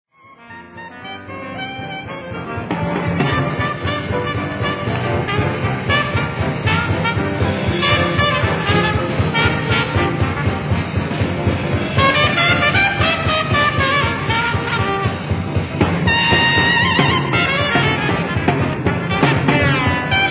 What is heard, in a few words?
baby jazz, drums